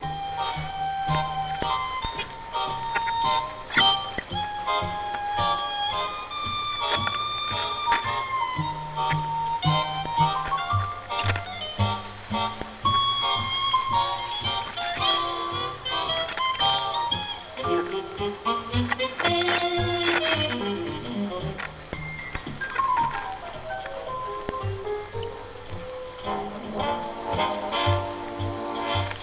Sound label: Jazz Music; blitz brigade; android